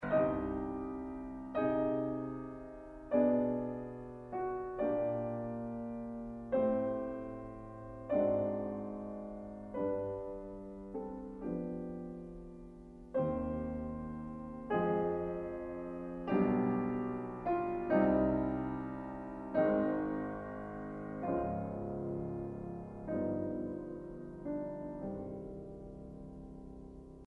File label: prelude in c minor piano; chopin